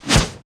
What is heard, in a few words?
BOSS Atk; Fabao